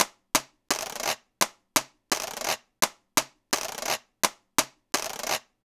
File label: Washboard, Latin Percussion; Sonic Foundry; Joe Vitale Latin Percussion